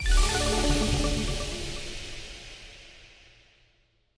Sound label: intro Free Spin Start; fairyhansel